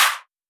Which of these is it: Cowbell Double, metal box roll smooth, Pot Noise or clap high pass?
clap high pass